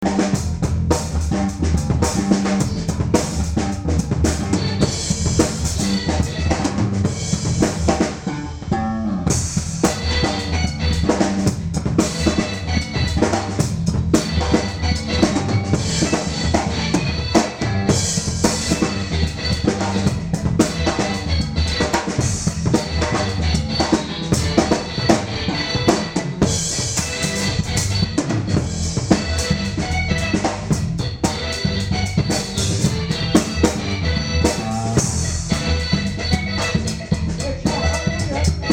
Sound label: funky Jam Bit